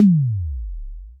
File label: Kick, Drum & Bass; AMG - Prototype Drum & Bass